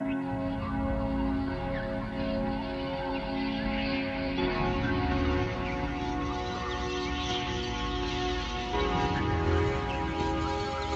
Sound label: ambient meditation AMBIENT CITY